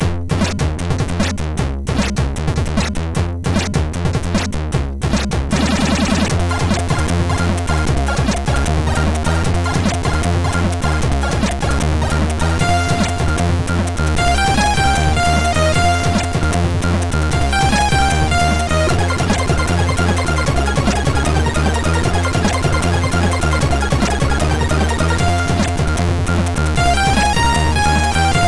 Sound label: Flatline tune, sid MOS6581R2